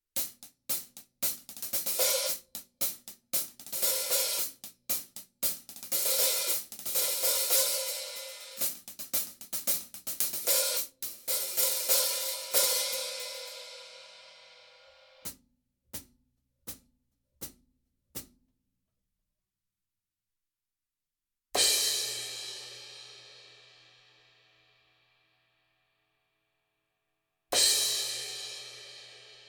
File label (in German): Becken einzeln